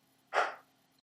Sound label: osx sound